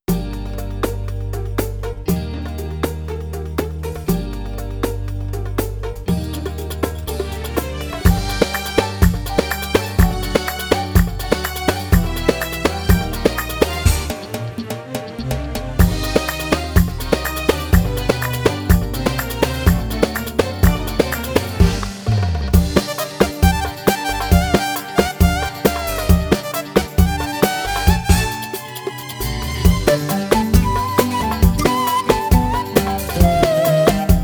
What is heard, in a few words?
Oriental